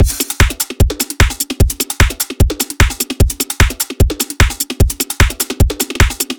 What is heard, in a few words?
drum loop jogging 150